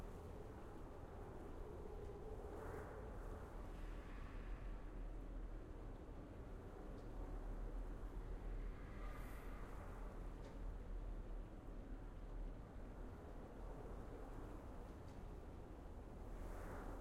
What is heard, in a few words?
amb cathedral neutral; tft